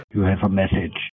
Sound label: Message Tone